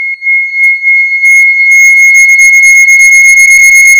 Noise

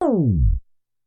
Tape Slow

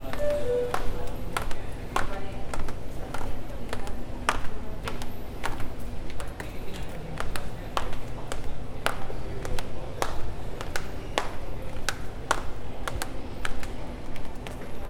Slow walking Beat

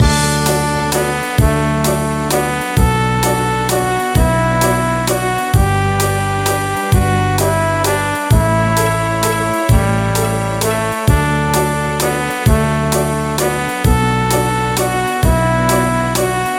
Waltz Game Theme Loop

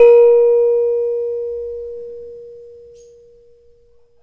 Demung

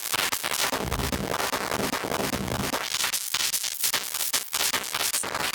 Analog

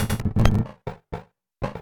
Glitch; Outtaqes; Suonho

